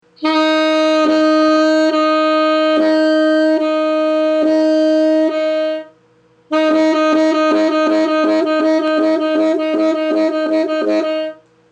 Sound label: 2nd overtone B